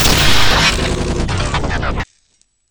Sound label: test mono; VoxelEngine